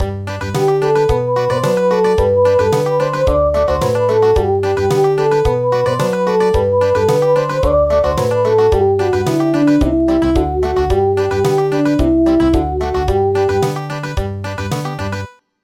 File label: Country; The chestnut